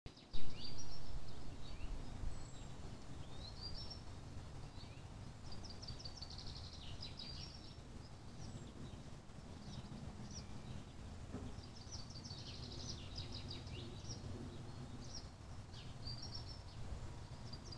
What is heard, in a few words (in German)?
zwitschern